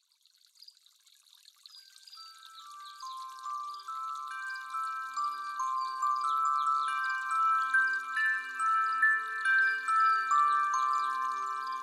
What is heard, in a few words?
Morning Mood Ringtone